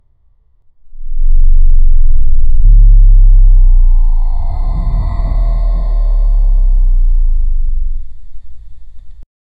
second of silence sharp, reverse swell

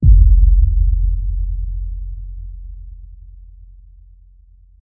dark cinematic heartbeat

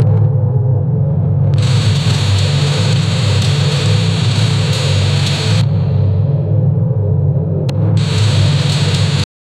tribal warriors

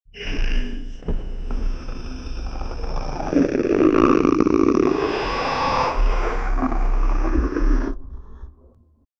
werewolf transformati

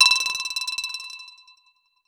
tetris piece landing impa

